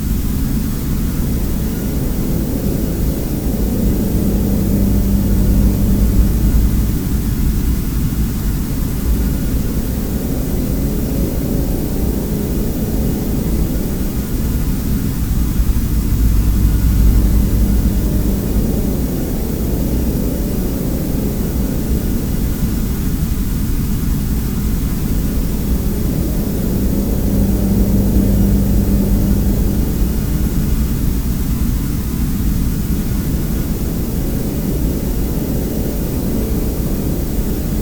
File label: Ominous Noise Loop; Musical Intros